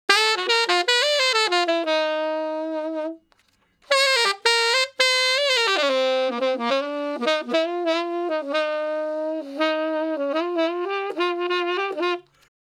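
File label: Sax Slow Blues (Bb V, IV, I, V), Tenor Sax; Crimson Blue and Fabulous Horncraft 4 RnB; Horncraft for R&B; Solos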